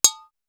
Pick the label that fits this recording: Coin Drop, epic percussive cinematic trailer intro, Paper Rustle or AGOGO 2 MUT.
AGOGO 2 MUT